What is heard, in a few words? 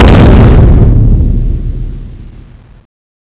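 attack meteor explosion; cstrike; Teddy CSO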